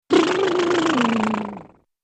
Vocal brrrr Chill, reactions

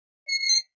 Metal Scrape On Metal

